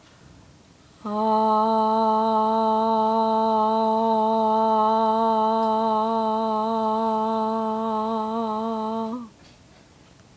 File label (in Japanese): ミックスボイス